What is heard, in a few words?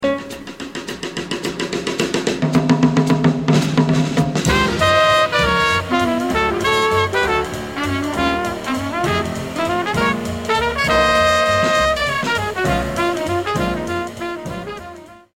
shout